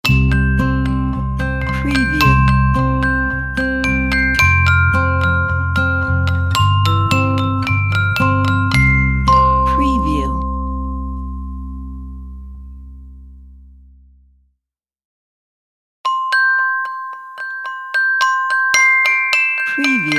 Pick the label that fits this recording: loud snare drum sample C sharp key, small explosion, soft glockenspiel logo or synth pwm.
soft glockenspiel logo